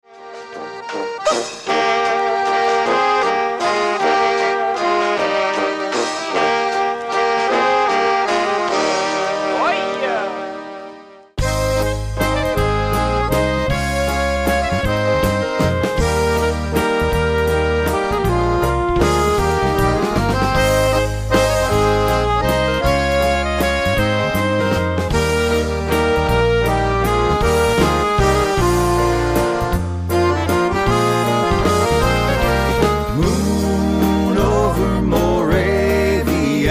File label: Waltz